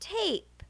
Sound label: tape